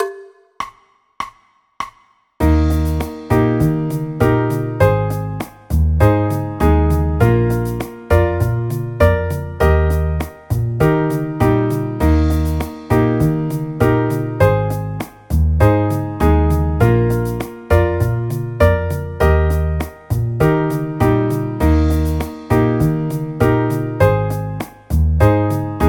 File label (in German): eb dur akkordverbindungen